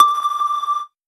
Wobble

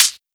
kick

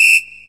whistle

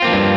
Arpeggio up F#m7(11)

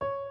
piano high

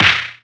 house snare; osu

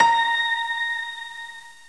piano

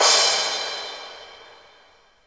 soft hit finish

